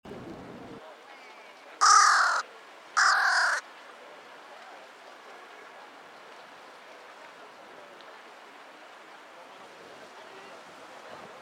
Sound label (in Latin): Corvus corone; SETE